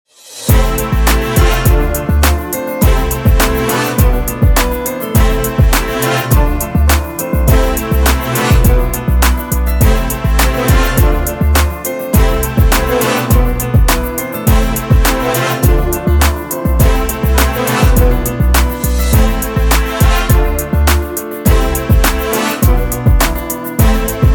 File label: song